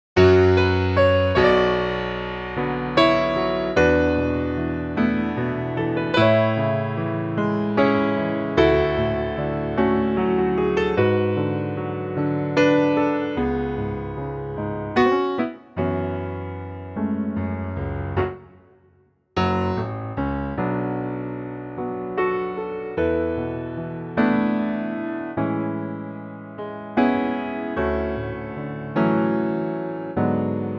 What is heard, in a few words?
Gbm Piano